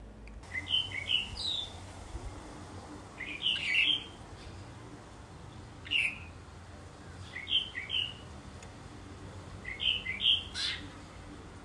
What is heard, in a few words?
tropical mockingbird